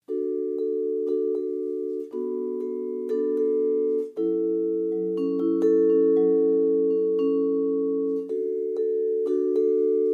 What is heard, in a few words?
Vibraphone